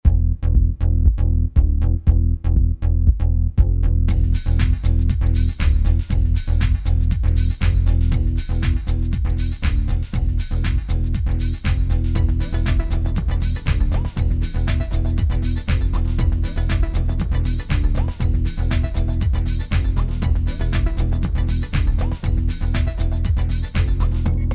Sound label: Techno